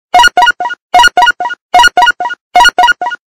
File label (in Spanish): blackberry mensaje, sms; ringtones